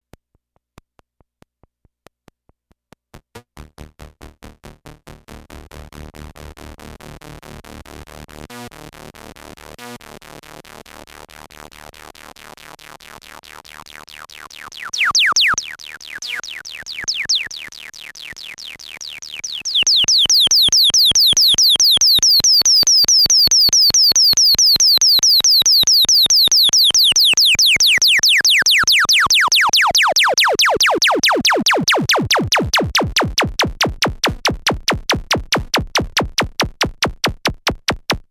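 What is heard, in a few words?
highpass Bass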